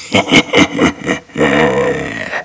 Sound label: laughter